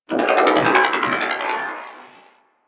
Electronic Gust; Ambience; Warframe; Corpus Railjack

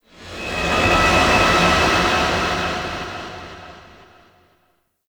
Rising Vocal; Props; Ropalolyst; Warframe; Ambience; Sentient Devourer

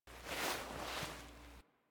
Queens Chamber Foley Operator Turn; Warframe; The War Within

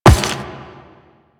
Run Rock, footsteps; Warframe; Quests; The New War; Kahl

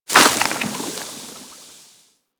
Poison Hit Effect; Warframe

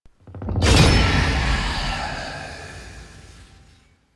UI Octopede Defeated; Warframe; Entrati; Swarm

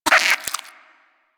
Vocal Tranq Grunt; Warframe; Hunting Critters; Nexifera

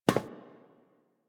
NPC Step Default, Footsteps; Warframe; Friendly NPC

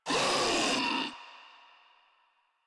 Infested Ancient Player Spotted

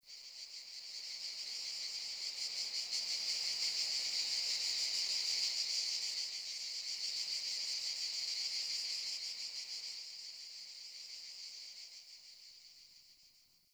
wetland forest day cicada swell; Ambience

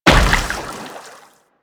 Moa Combined Step Water, footsteps; Enemies; Warframe

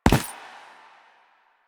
Butcher Step Default, Footsteps; Enemies; Soldiers; Foley; Warframe; Lasrian

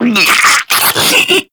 Infested Diseased Ancient Attack; Warframe

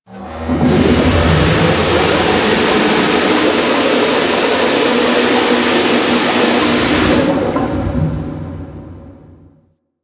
Steam Blast Large, Ocean; Warframe; Grineer Ocean

